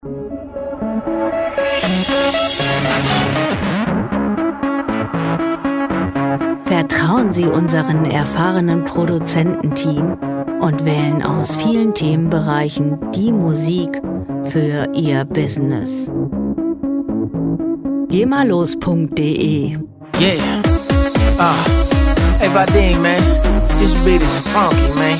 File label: screech